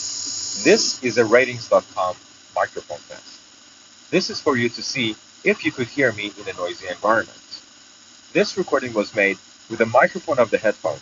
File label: noise